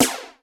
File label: Snare, Yamaha RY20